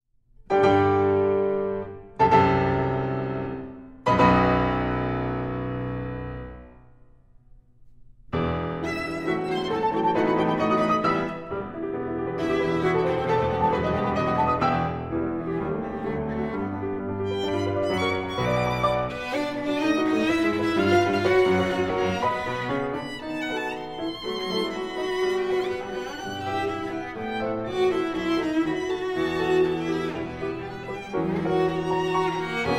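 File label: piano trio; coleridge-taylor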